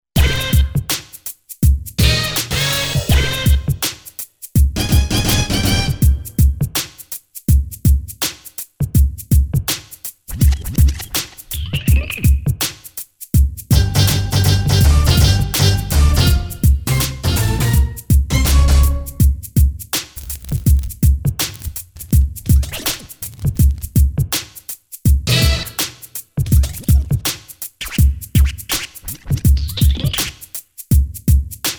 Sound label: DJ Tool Kit